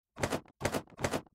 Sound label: Bird Flapping Wings; el-pollo-loco